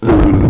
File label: ugg; wesnoth